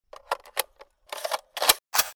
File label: mp40 reload, weapons